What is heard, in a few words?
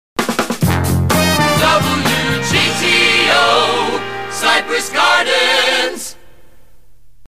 jingle station ID; WGTO